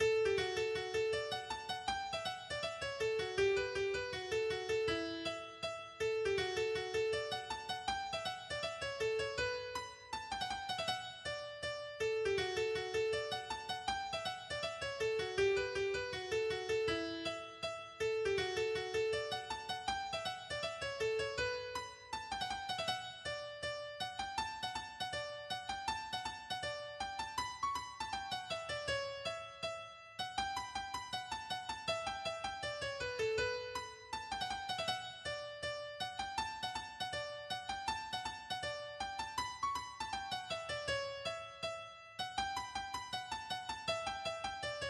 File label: Browns Hornpipe